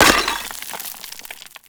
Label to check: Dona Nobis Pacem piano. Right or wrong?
Wrong